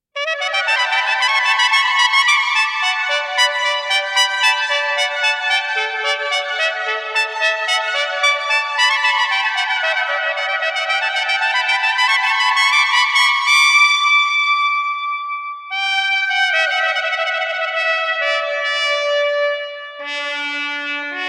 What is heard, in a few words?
Fanfare